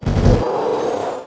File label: BUILDING DESTROYED; Project Nomads